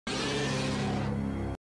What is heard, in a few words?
Roar; Silver Hydra